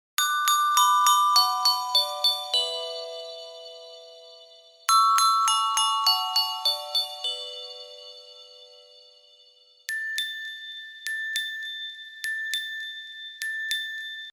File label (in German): Glocken